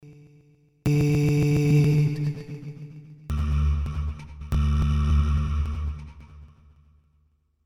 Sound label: Loop Position Modulation